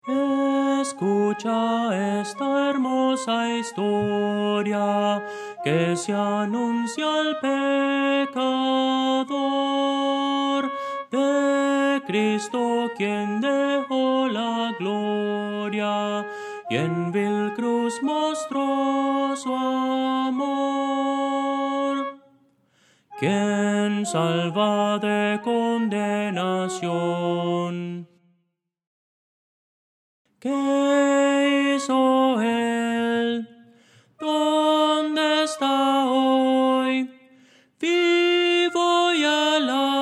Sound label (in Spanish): melodia, himnos cristianos